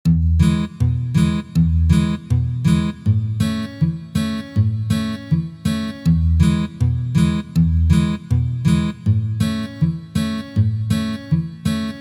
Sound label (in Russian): Boj Kantri na gitare